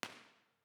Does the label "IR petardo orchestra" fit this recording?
Yes